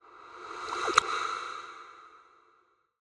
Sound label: water swell; soundscrate